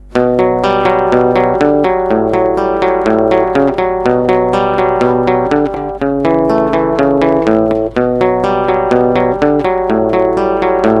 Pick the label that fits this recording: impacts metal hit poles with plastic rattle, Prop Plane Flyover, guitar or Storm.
guitar